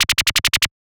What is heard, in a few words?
shooting